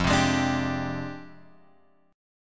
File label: F Minor 6th Add 9th F; Fm6add9 Chord {1 3 X 1 3 3} strummed